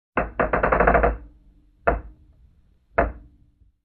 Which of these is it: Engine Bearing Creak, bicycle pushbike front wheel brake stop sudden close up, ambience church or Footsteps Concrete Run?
Engine Bearing Creak